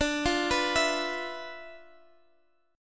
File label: D-Minor 6th Add 9th, D, Guitar, Open F; x,x,14,12,14,11; Dm6add9 arpeggiated